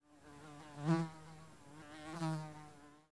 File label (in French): mouches